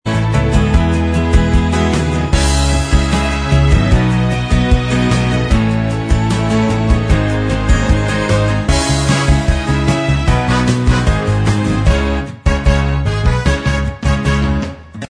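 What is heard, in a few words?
Instrumental Track, backing tracks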